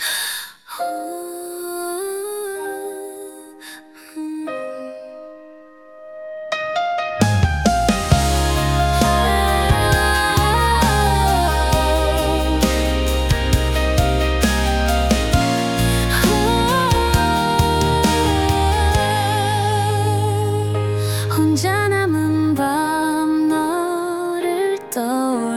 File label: modern ballad F; acestep